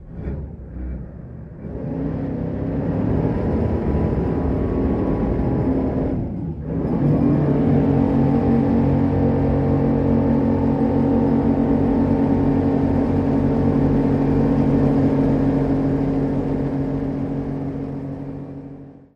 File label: Military Pbr: Onboard Hard Reverse, Hard Acceleration. Dual